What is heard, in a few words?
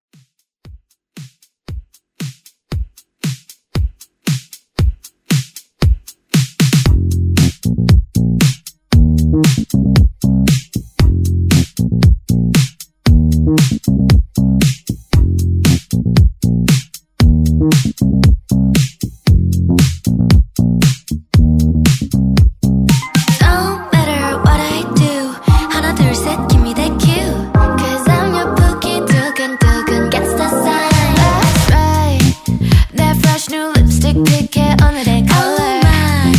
Pop Intro Drum